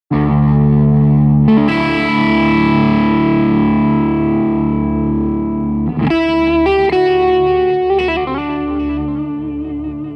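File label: vh4 channel 2 crunch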